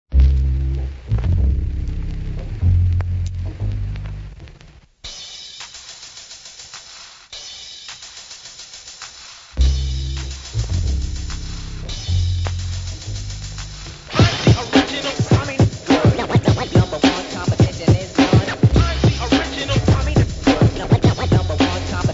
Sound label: Scratch